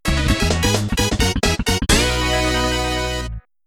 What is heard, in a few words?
Star Catch Fanfare; super spamton 64